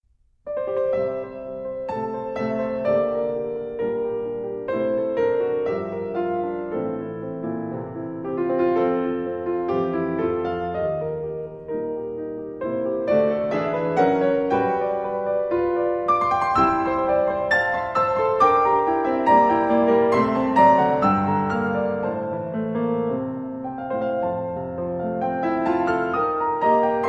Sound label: SonPiano